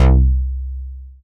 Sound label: BOTTO, SYNTH BASS